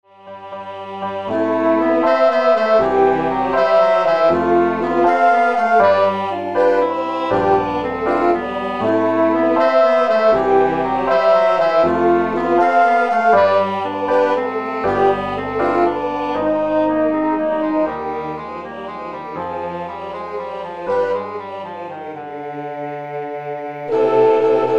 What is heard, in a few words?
SATB computer; Maggies Rant